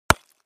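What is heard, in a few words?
Cinder Block Hit Sledge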